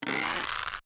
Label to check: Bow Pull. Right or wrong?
Right